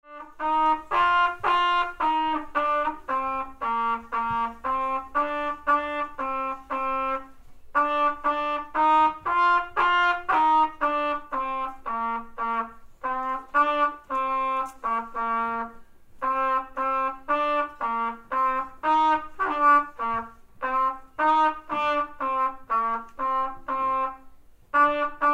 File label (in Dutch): vredeslied trompet